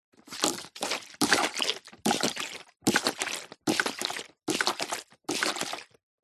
industrial pump hand plastic small pumping water plastic bucket